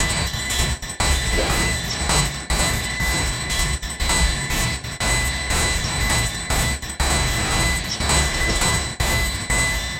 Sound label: cyberpunk style sneaker; cyberpunk style sneaker f; action game